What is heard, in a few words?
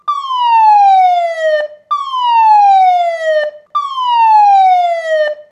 yeow; Tomar Electronics